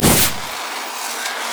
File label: flash frost; LoL; anivia